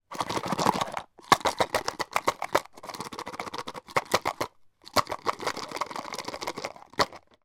food drink ice cubes in cup shake no liquid; macdonalds

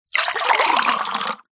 food drink water pour into glass fast

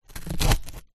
foley cardboard sharp object pierce dig in

